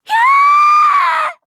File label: bloody scream